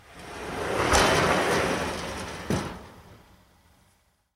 Slide door

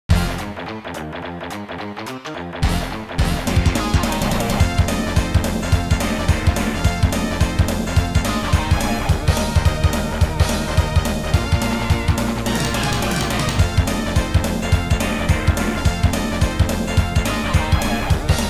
Alleycat Blues; the cowabunga collection

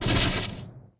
Dark; The Denpa Men; Android

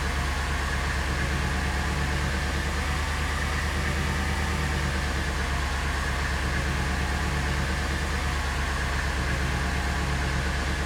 Corvette Loop

